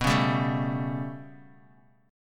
B 7th Suspended 2nd Sharp 5th down Bass Standard, chord; B7sus2#5 strummed; JGuitar